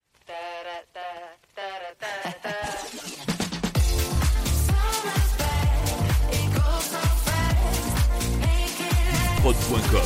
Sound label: Medley Hits 128 BPM